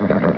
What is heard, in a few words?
gurp; ornithomimus